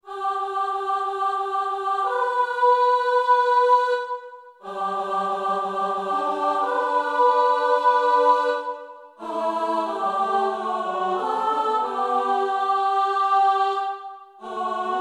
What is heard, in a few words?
Sop